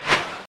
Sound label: fly punch